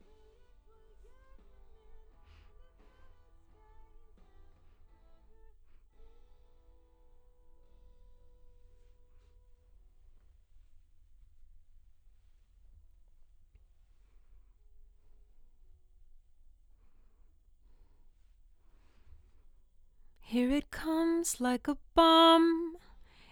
LdVOX

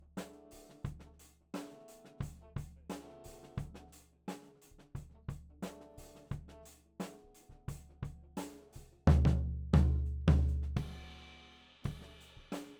Floor

